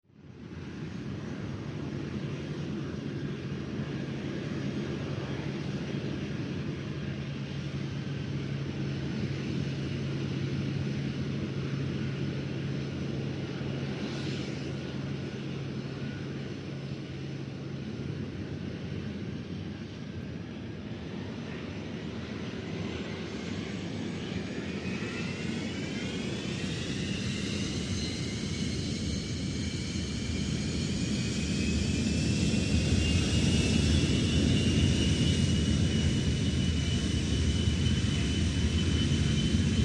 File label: Jet takes off ( threshold of runway perspective